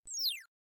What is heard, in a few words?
Hit; Mario 3 Around the World; Genesis